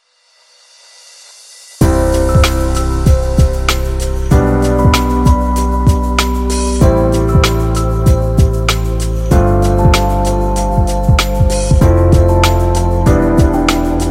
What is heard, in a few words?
Chill Fashion